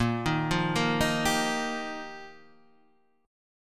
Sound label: F 7th Suspended 2nd Suspended 4th